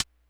hihat; Big Fish Audio; Hardcore Breakbeat Action; Robot Live

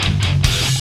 GTR; Roland - LA Composer vol. 2

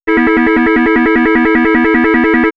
Alarm, synth fx; StarTrek; X-Static Goldmine

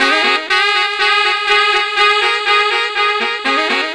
Tenor Effect, sax; Effected; Sonic Foundry (Sony Creative Software); Jazz Solos and Sections

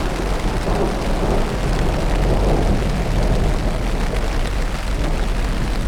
Weather, On The Glacier

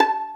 Violin Pizz A 4; Instruments & Percussions; InVision Interactive; Lightware VOL-1

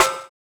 Snare, jungle breaks drum sounds

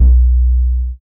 CYBER KIT, DRUMS

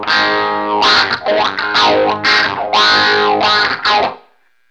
DOWN HOME, Funk Gtr & Wah; PlatinumPhattCD2; E-MU Producer Series Vol. 8 – Platinum Phatt

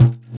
EQ Perc, Percussion Mix

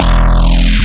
E Bass Shot Stretched; neuro; BIG5AUDIO

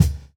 Kick, jungle breaks drum sounds

